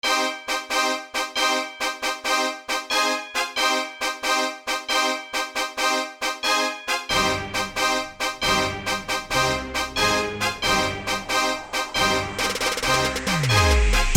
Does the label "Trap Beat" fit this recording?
Yes